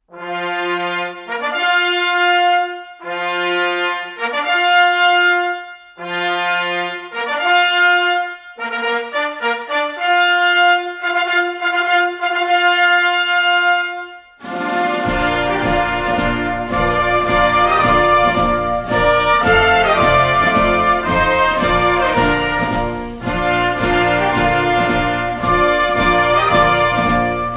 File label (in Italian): Alzabandiera Inno completo